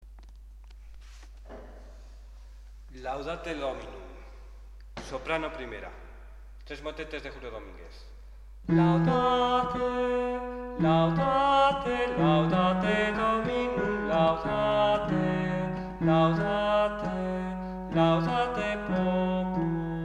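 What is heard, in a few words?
soprano; 3 motetes; laudate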